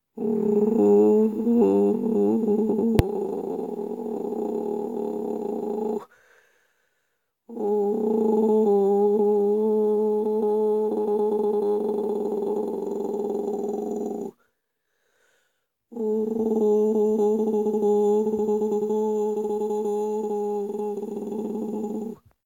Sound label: Diplophony; practicing